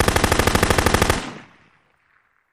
Machine Gun Single Rapid Fire Burst

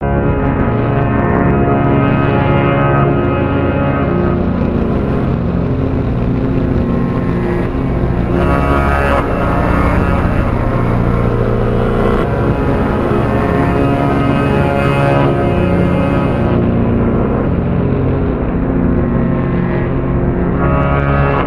Horns Dissonant Tones; evolving

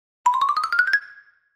Xylophone Come Upsta

